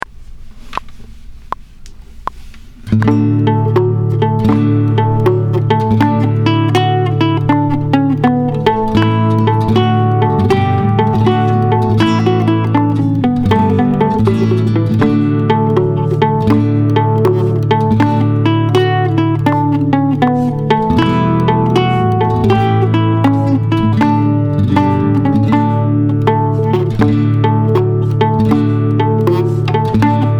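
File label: harvest home with chords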